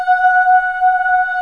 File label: BEAUTY VOICE, ENSMBL; AKAI S-Series CD-ROM Sound Library VOL-8